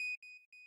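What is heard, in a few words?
square lead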